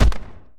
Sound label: semiauto